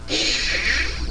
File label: zipping fire; Short Circuit v1.0